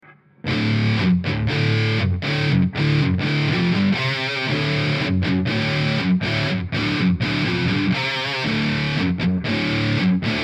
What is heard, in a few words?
2 dubs; tc electronic mimiq doubler